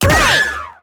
break; komaru naegi

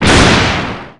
damage

